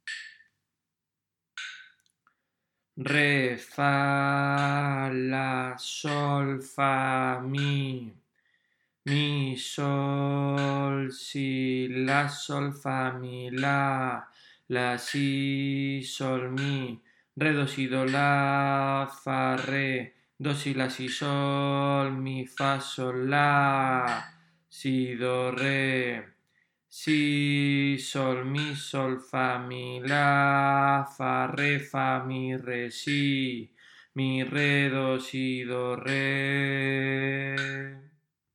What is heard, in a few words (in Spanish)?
RITMO